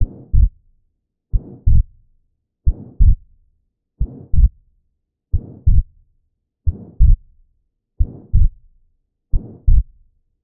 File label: Pulmonary stenosis